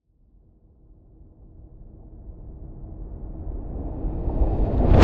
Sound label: Swell; bottlerocket